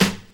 Airy Steel Snare Drum Sound G Key, Royality free snare drum tuned to the G note.